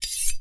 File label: fx foley swords